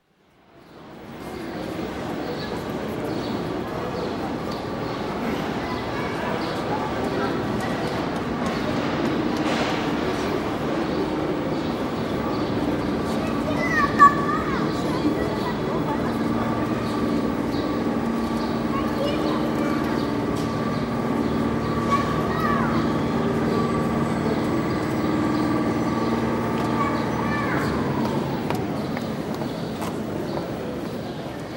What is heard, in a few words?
station concourse atmosphere at ticket barrier as train arrives, with electric trolleys passing, indistinct; Gare D'Austerliuz; France; BBC